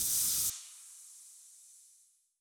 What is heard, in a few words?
mega shipyard electricity amb; Stellaris; federations